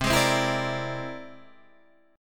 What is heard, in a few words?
C Augmented 9th, Guitar, Open D